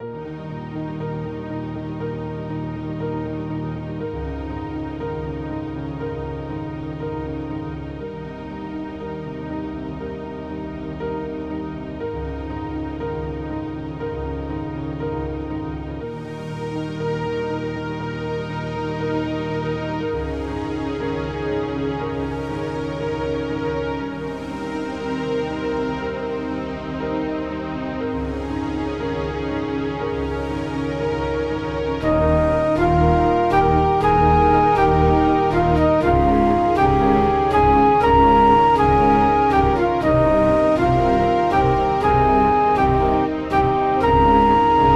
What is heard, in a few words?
cinematic ending